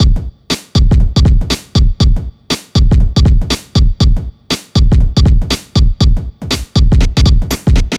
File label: NRG Break, Trance, Drums, Breaks